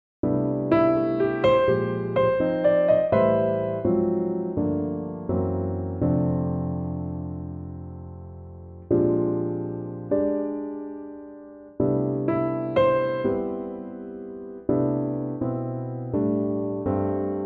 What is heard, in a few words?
C piano